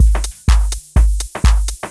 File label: loop 125bpm, House Drum Loops; E Lab; XTc Files of House; 122-134bpm